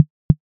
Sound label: knock